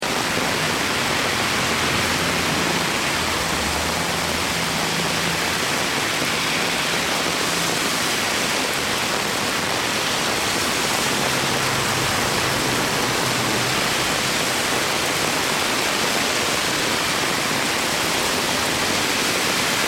rain heavy wind Tokyo Japan, Heavy rain, Tokyo, Japan. Some wind; Some wind, distant traffic. Rainfall on umbrella, perspective under; Rain Sound Effects